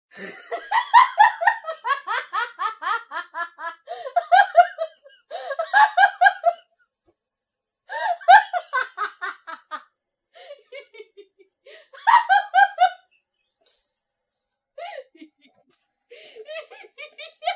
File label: Human Female Laughing